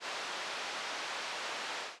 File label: rain; prologue